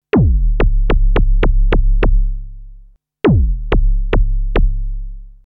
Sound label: Kick Drum Cutoff